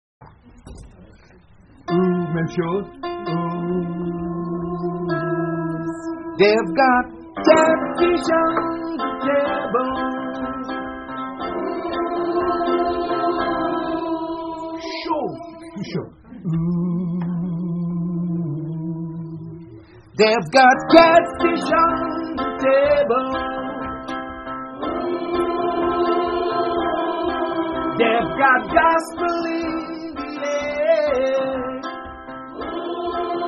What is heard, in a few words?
Alto